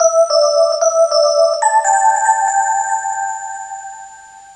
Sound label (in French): mettre perle; mettre perle1